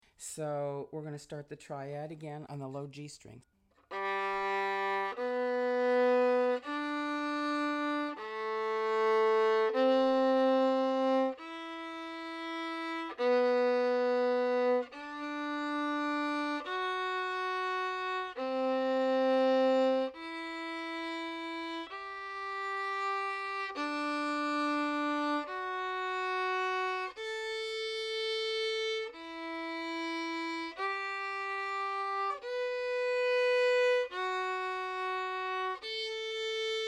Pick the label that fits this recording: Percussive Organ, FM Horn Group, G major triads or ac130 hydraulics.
G major triads